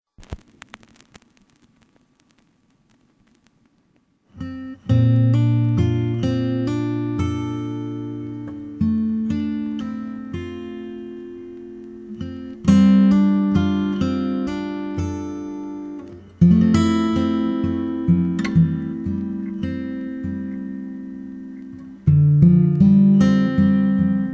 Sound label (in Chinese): (Guitar Version 吉他版)